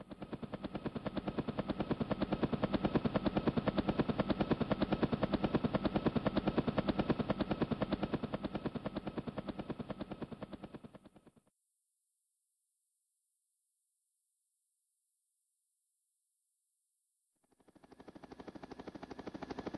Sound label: song